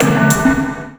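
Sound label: Bello Oneshot, beats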